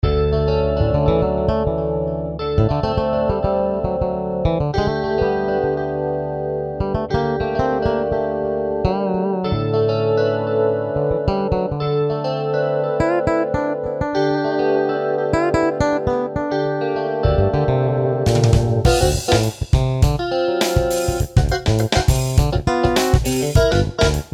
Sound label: guitar bass tone